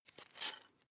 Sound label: glass pepper shake short; soundpool